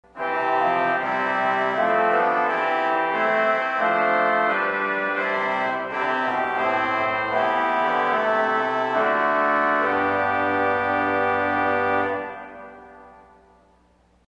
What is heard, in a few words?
Bombarde St Anne, organ stop; CulverAcademy